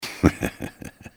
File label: laugh; Soldier Of Fortune II Double Helix; XBOX